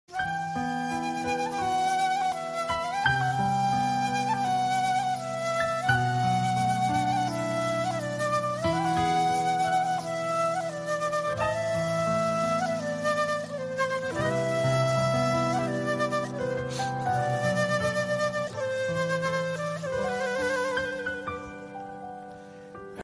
romantic turkish

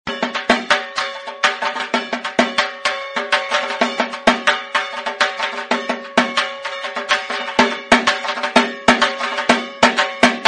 dhak sound ringtone

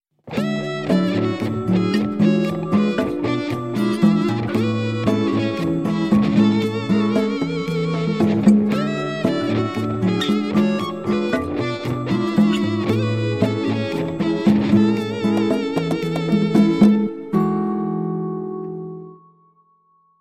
nokia latin

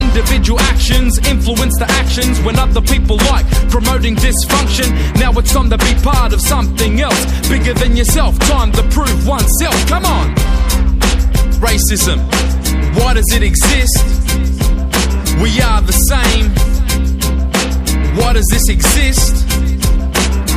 australian ringtone

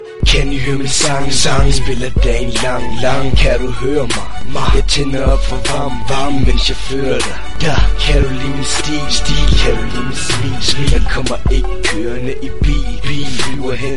danish rap

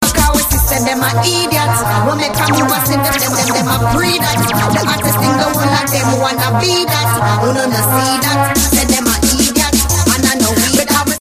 ringtone

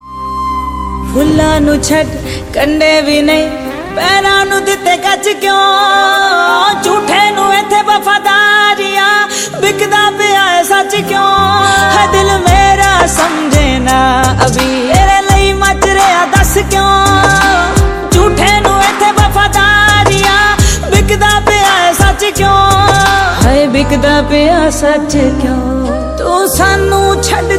punjabi song ringtone

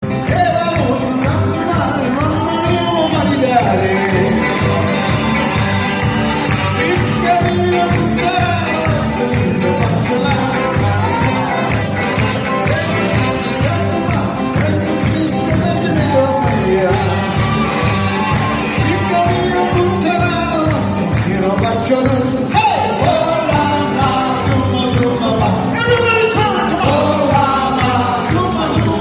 tarantella wedding

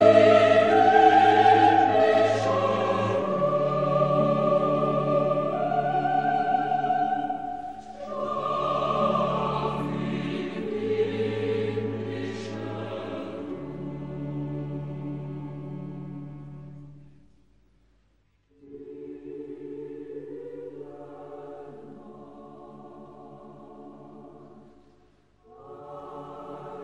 traditional christmas

